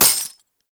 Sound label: Fist Claw Coin Hit; Warframe; Tenno; Incarnon